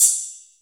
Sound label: quiz, Bells